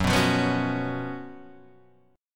Gb Major 7th Suspended 4th, down, Guitar, Half Step Down